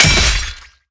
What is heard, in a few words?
Attack Axe